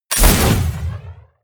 sci-fi plasma cannon fire

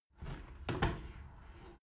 window open tilt soft sequence

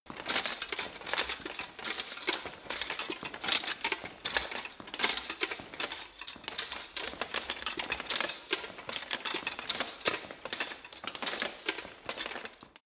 walk sequence

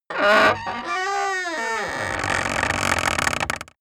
wood gate close creak heavy

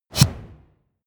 push

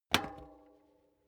bicycle street foot pedal hard

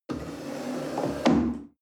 chair wood slide on floor soft bump

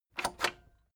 cassette eject click fast

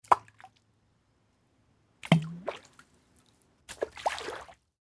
stones falling into water

